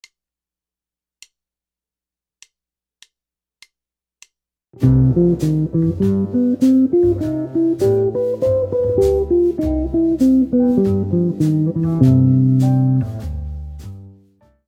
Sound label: bebop, jazz guitar